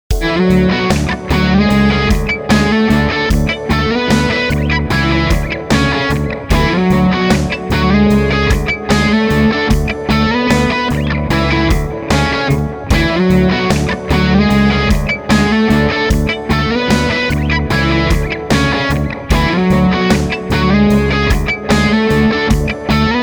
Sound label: Lick